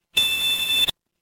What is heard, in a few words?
office card reader